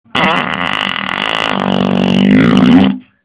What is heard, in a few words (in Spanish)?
Pedo casa suegros